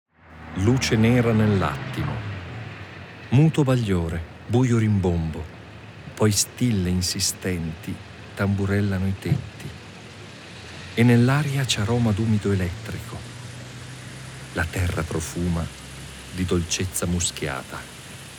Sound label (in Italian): Temporale notturno